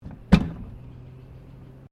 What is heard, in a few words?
Door Pivot Wooden Cabinet Small Open; Various doors; Miscellaneous Doors